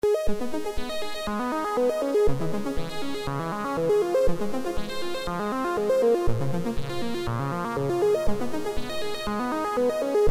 4way switch

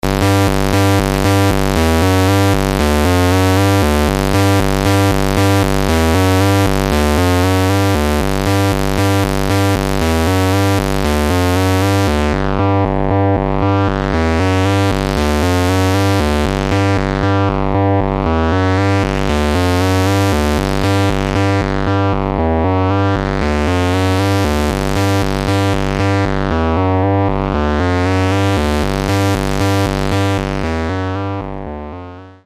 quant, modular; sequencer as source, MFOS 12db filter comes in later; fonik

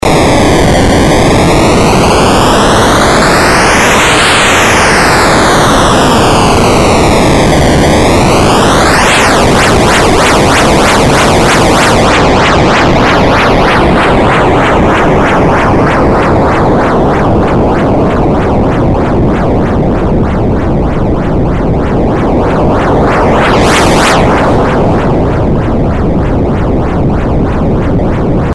noise, modular

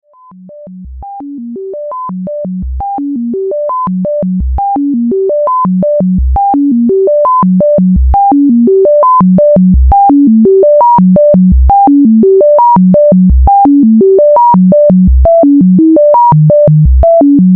ssm2044 osc, modular

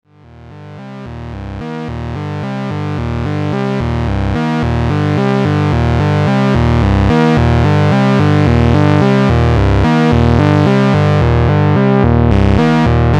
ssm2044 VCF, modular

